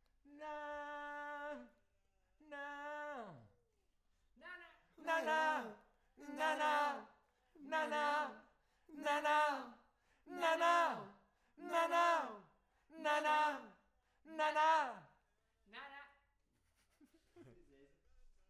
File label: Voc